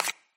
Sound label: card slide